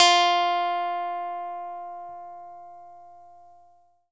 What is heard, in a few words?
E-GITARRE, Alpine Volksmusik; Best Service